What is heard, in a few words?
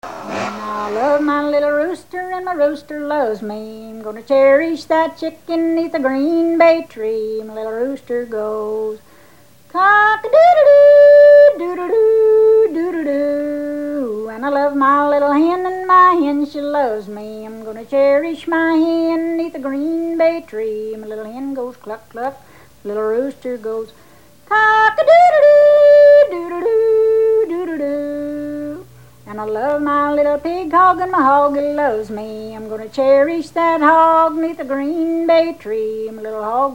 Rooster